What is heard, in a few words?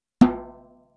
Djembe